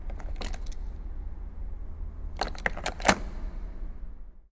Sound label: indoor